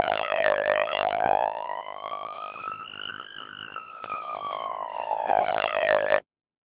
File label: siren, CODEC2 2400 bps